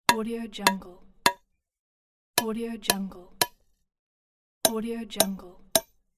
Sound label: simple chopping wood